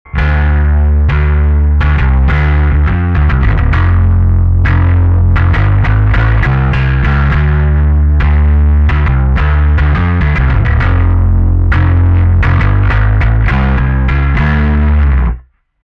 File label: More Alpha mix switches slight bassboost